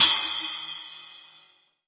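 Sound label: Splash Cymbal, classic hip hop; STYLE KITS; Classic Hip Hop Mega Kit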